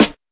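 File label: snare, Classic Hip Hop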